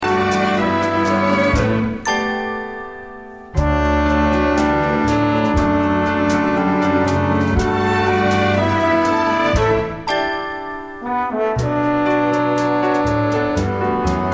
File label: accompaniment only; audition